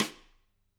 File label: K2 room SdSt, drum